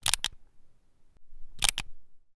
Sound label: gun bersa; still north media